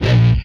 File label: Explosion, retro arcade; Explosions; MMRetroArcadeSoundsPack